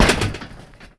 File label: metal grate, player footsteps